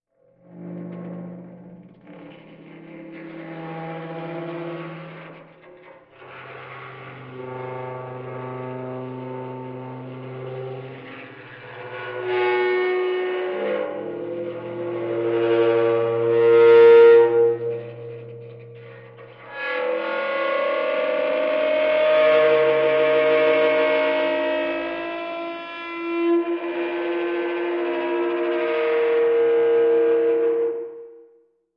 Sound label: Dry Ice Eerie Slow, dry ice